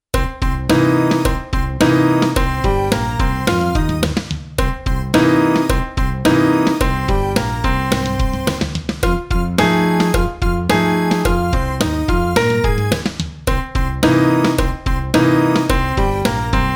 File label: Jackhammer Blues